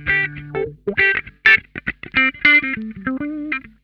HIDE N SEEK, Funk Guitar; 90 s Sample CDs; Zero G

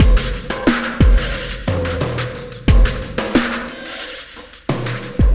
Sky Lab Beat, Processed Drumkits

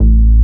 sub A, techno trance bass; Techno Trance Essentials

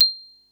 mallets

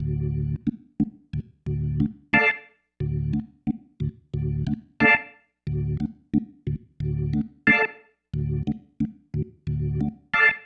Pattern, Organ

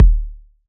kick machine; kicks

